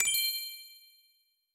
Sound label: Jump Button